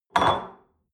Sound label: espresso upset table soft, restaurant cafe